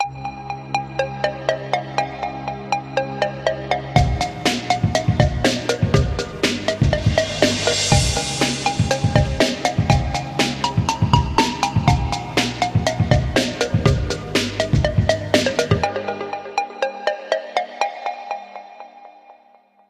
Rise up; Tecno Spark 7; alarms

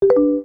Bubble

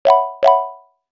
Knock, notifications; LG Qua tab PZ LGT32

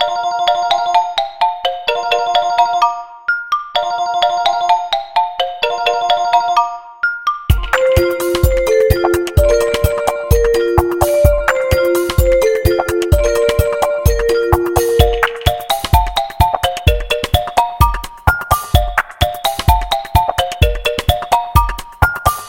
Wind Whispers; Doov A8

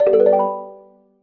sms, notifications; Lenovo P700i

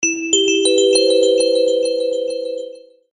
notification; Infinix A88

